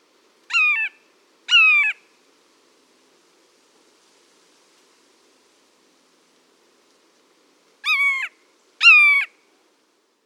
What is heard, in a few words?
Red-shouldered Hawk